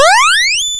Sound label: Hard PCM Chip; chiptune